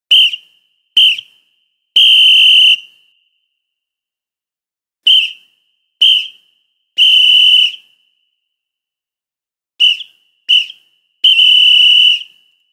referee's whistle; سوت داوری

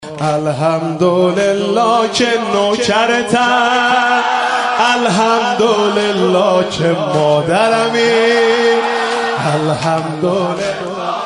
Islamic ringtone

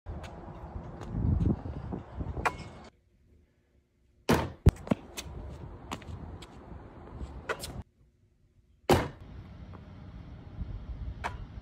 Basketball hoop

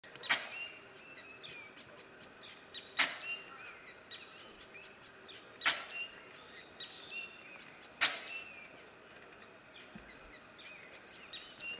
Himalayan goral

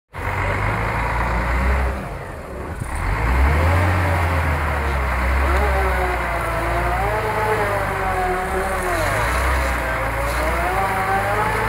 Benz bus

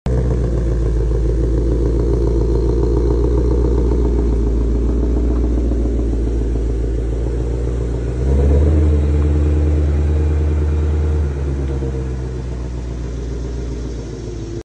Dodge Viper

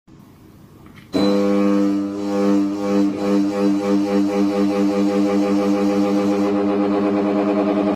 ceiling fan; پنکه سقفی

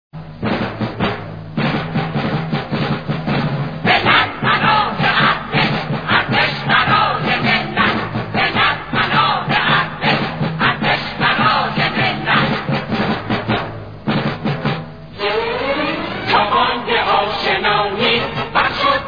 victory march

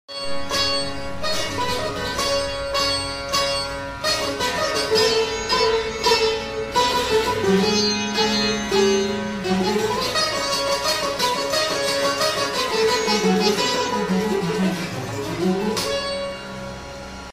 Veena

